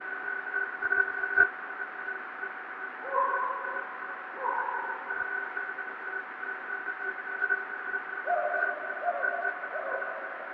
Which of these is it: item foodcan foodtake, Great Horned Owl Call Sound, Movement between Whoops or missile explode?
Movement between Whoops